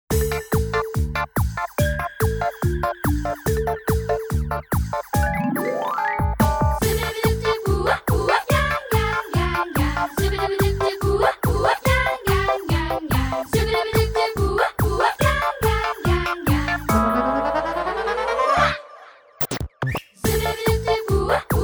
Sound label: Alien Song; the amazing nhs and the aliens at christmas